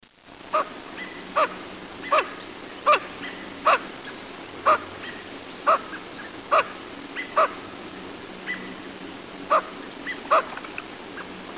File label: lesser scaup